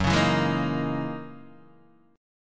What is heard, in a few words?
F sharp 13th F sharp down Guitar DADGAD